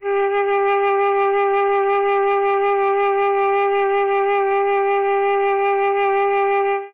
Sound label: flute; Winds